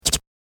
Snip Double Click; Andrew Fleming

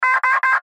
Radio Morse Code; Weak Signal Letter O; Warfare; Blastwave

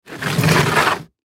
Toolbox Slide, Toolbox Slide Plastic; Hand Tools (Sound Effects:Tools); Blastwave

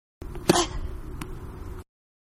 Tennis Hardcourt-Serve-With Female Grunt; Tennis (Sound Effects:Sports); Manhattan Production Music

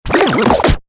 Turntable Scratching Reverse; Dj; Musical; Dj Sound Effects

